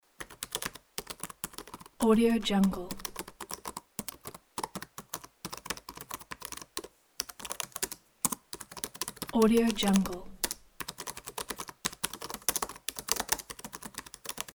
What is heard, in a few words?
laptop keyboard quick typing